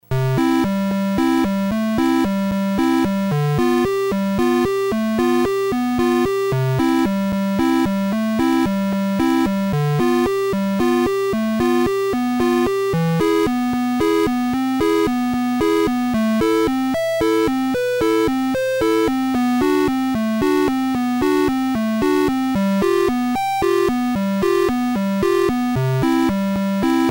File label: Guitar